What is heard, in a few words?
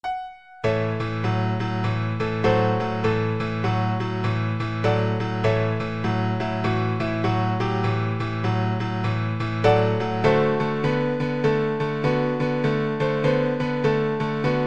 blues